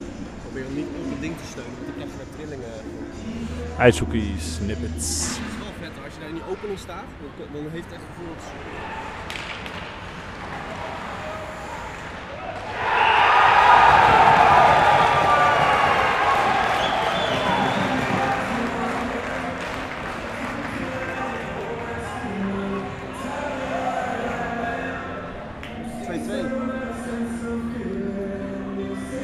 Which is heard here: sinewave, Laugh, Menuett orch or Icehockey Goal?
Icehockey Goal